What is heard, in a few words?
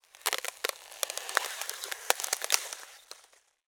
nature ice crack